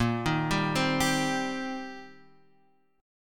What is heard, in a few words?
D sharp 6th, A sharp